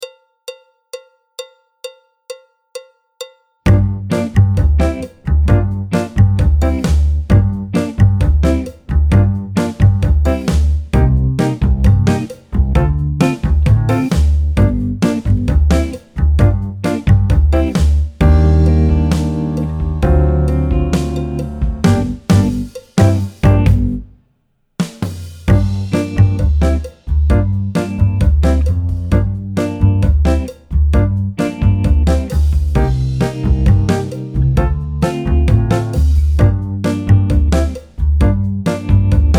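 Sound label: Samba backing track, Jazz; alto, trombone, piano; Jazz Syllabus Level 2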